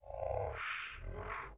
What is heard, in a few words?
dino slur; angband